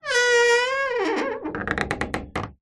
household wooden door old large heavy basement creak